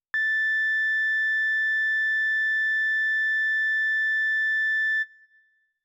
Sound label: quick Slow Emergency tone